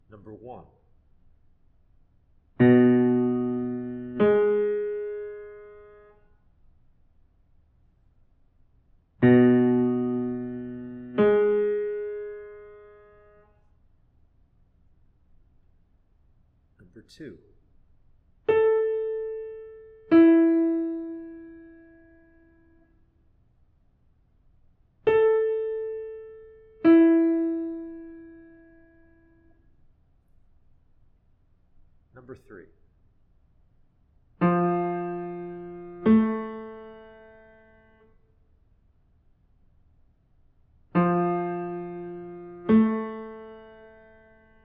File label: intervals